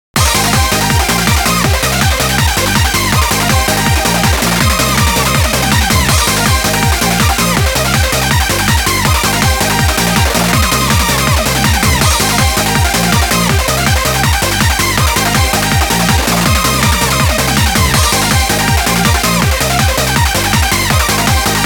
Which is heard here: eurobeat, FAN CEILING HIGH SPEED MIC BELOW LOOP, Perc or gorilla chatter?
eurobeat